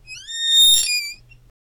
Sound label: the creaking sound of a